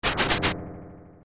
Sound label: runaway; ff3; ff3us